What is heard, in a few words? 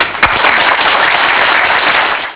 end level; pang